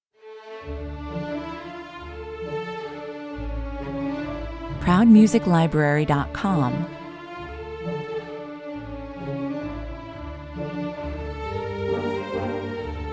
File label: waltz no percussion and no piano